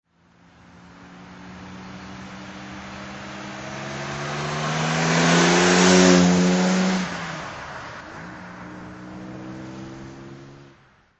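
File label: Jaguar XK140 S drive by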